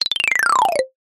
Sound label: cartoon climb down descend fast steps ladder cute